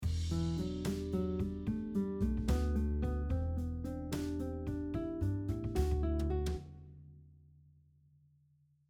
Scale pattern 1 improvisation, 3 note E minor scale pattern over an E minor 7 chord and a D Major chord, guitar